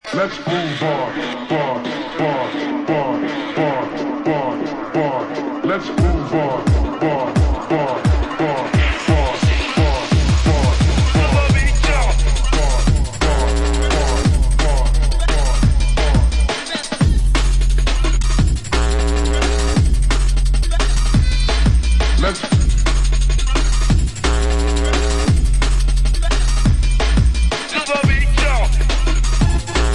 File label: Drum Bass